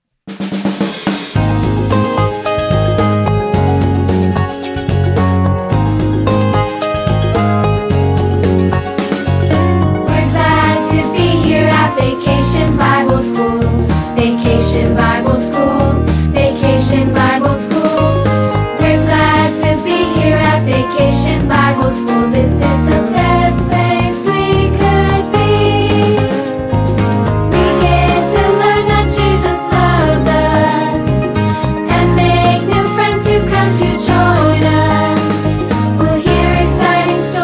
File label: Instrumental with Vocals